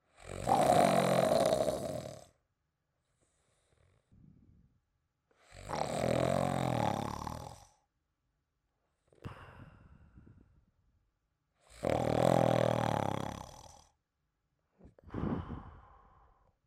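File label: snoring sound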